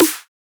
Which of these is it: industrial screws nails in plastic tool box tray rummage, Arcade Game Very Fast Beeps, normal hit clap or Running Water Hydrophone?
normal hit clap